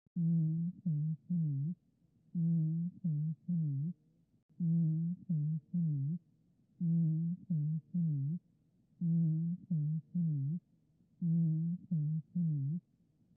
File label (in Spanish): Pectoriloquia normal; Exploración del sistema respiratorio